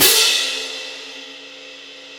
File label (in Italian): piatti ff; Digital Sound Factory Virtuoso